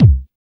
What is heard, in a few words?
DB KICK, TECHNO; Drums In Your FACE!